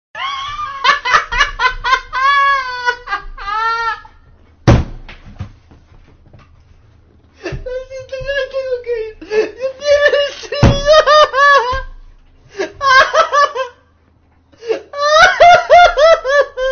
risa; knekro

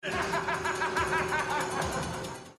risa; Dennis Hopper

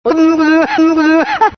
risa; chuña

